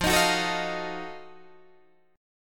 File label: F 7th Flat 9th, Guitar Open D